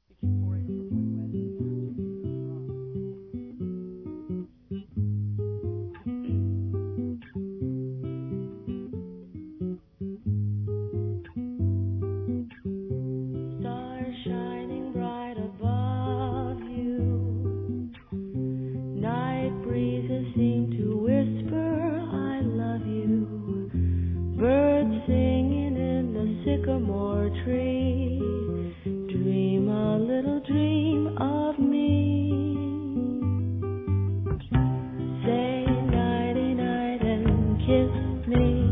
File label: song; butterflydreams